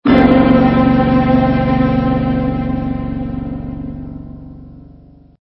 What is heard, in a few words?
Stinger; STINGERS